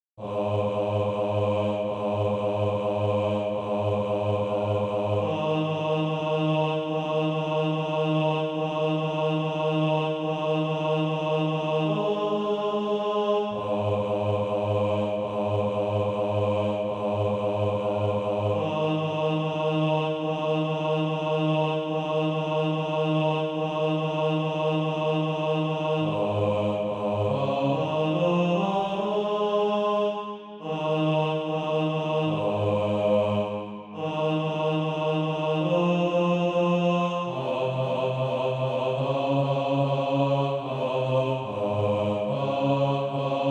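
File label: bas, Practise Music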